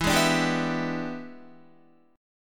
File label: E 7th Sharp 9th E down Guitar 4F 4A 5C 5G 5C 6E; E7#9 strummed; JGuitar